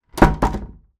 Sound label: household door close med internal wooden; zapsplat